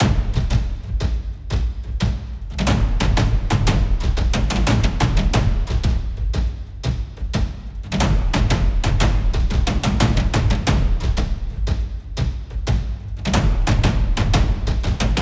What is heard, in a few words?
Action Drums Dry